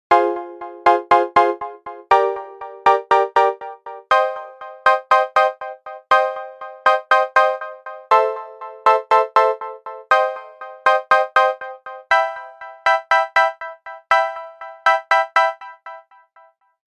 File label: tech house